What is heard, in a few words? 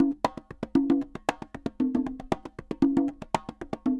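CONGAS SALSA, salsa drums and percussion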